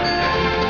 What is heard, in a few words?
flag right; usa